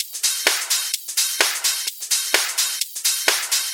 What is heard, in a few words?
House Loop, 128 BPM; Vengeance Samples